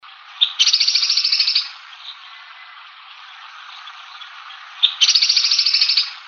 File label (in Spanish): Carpintero bataraz chico, Carpintero Bataraz Chico (Veniliornis mixtus)